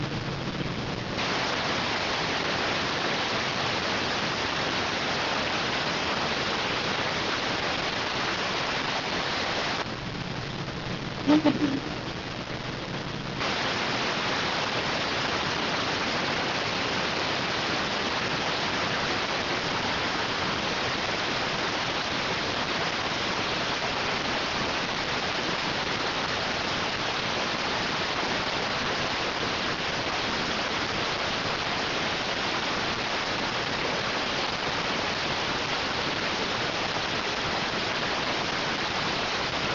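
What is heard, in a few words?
radioteletype receive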